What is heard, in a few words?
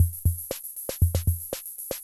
Loop, classic machines, rhythm77